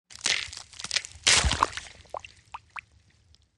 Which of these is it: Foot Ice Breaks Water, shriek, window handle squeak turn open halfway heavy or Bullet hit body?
Foot Ice Breaks Water